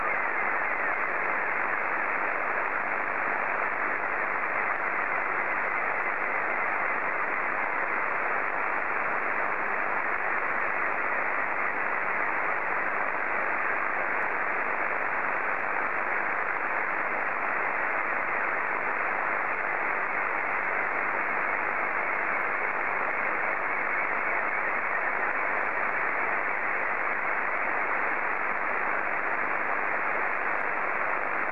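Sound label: SSTV, Scottie 2; JA3OEN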